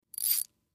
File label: Fishing Reel Reels In, fishing pole